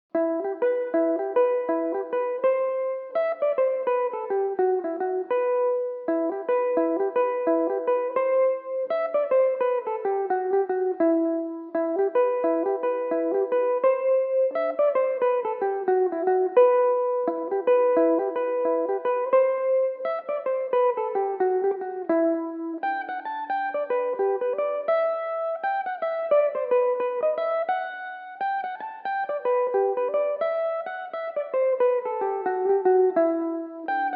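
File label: jig E minor belmuse, irish mandolin; The Maverick Jig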